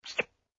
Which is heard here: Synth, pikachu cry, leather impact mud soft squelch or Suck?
Suck